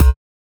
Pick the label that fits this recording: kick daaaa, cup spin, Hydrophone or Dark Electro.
kick daaaa